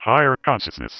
Female vocals and robo-vox

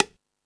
Latin Layers, Drum Machines E-MU Pro-Cussion

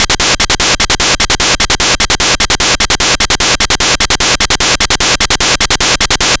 NES Noise Hats Loop; Sonic Foundry (Sony Creative Software)

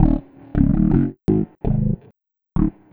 Funk Riff; Sonic Foundry (Sony Creative Software); Synchro Funk

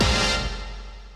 stab; Zero G; Man Machine

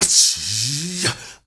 MALE SOLO, MALE NOISE, Heart of Africa

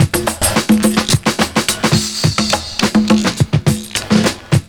PERC, loop; Univers Sons

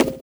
Conga; Equipped Music; Loungin House

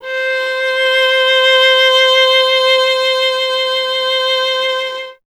viola C; Miroslav Vitous; Solo Instruments VOL-1

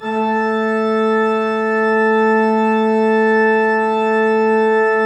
PIPE, 3DOrgans; E-MU Producer Series Vol. 5 – 3-D Audio Collection; 3D Pipes Dr Joyce

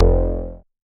Bass, Synths, 5U Modular

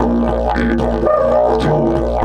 108, DIDGE 108BPM

